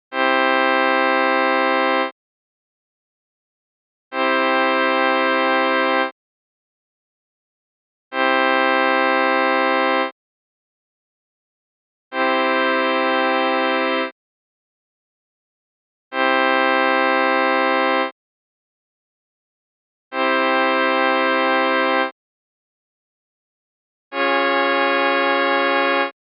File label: C Major Tempered Triads